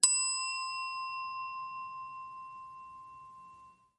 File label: bell